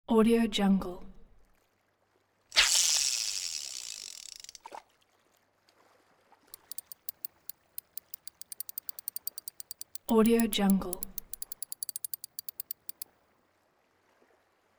fishing sequence with water laps

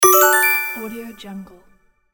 bright right answer

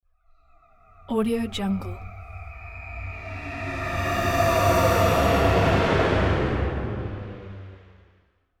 resonant spaceship pass by

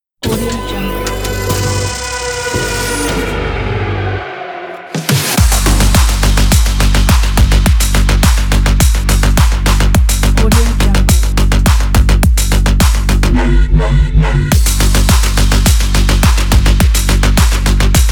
drive action electro crazy commercial

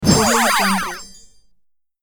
retro wizard spell

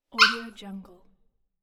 puppy barking

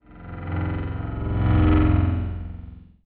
time exit subtle warbles

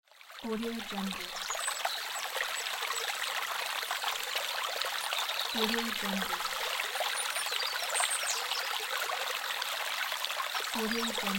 nature sound

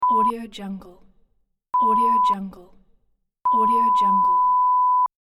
bleep censor

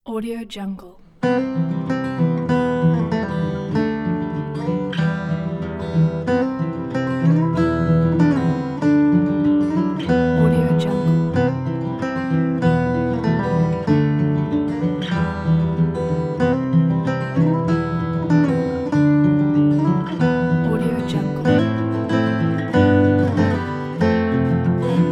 soothing acoustic guitar

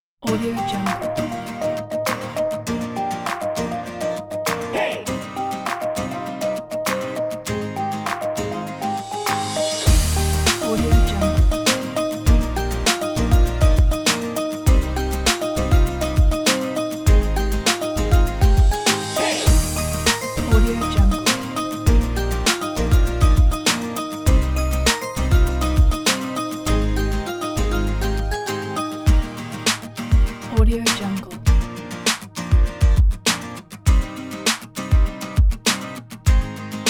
island dream; woodpeckerstudio

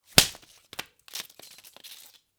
whip crack

